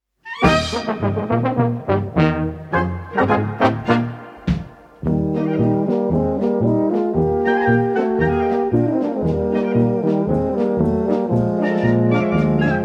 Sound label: Polka